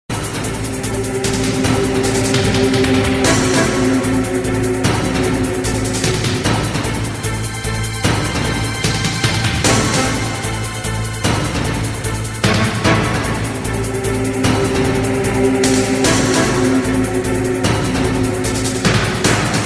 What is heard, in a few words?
Battle Music; Mgs